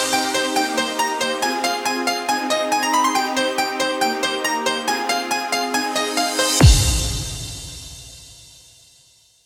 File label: win music; ff2; candyman